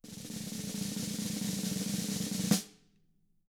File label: roll, snare rolls; ILIO; Double Platinum Drums 1